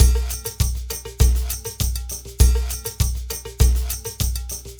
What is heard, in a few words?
Ensemble, Latin Percussion